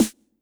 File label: snare, Hardcore Breakbeat; Big Fish Audio; Hardcore Breakbeat Action; Decimater